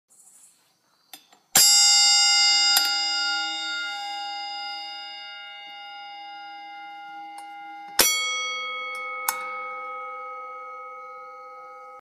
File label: Boxing Bell